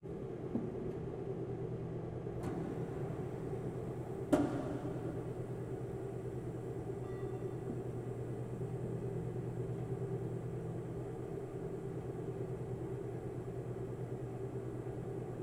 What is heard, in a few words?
Machine